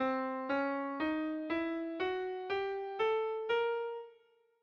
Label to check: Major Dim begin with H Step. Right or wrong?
Right